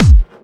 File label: Clubby Kicks, Bassdrums